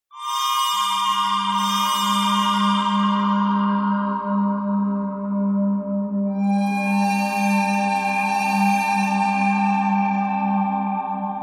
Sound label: bird thread harmonic